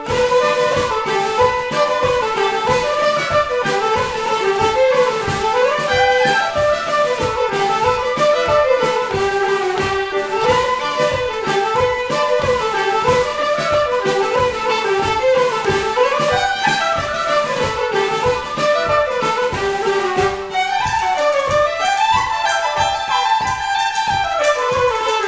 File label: Polka; Kilrush Polka No. 2; Finnegans Players List of Tunes